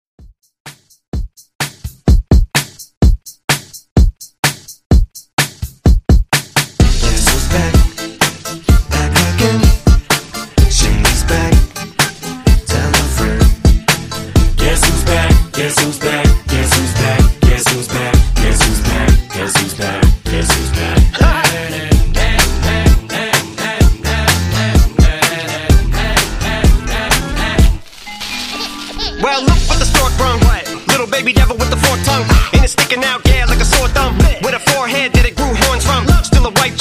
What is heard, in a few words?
Top40 Mixshow Intro 127 Bpm